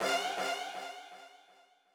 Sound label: chime; chime sharp; only way in chime 12